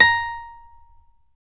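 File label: classical guitar, nylon string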